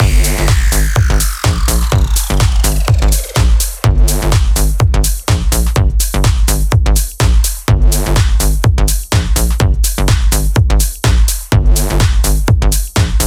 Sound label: Electro